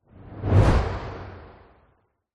sound design sweep transition hard hitting whoosh dark; zapsplat